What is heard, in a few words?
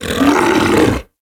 Feral Vocal Downed Break Free; Enemies; Kubrow; Tenno; Warframe